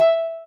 soft hitwhistle; osu

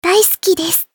applause

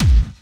normal hit whistle

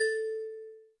Key

